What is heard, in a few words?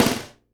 snare GLASS, Live Snares; E-MU Producer Series Vol. 8 – Platinum Phatt (CD 1)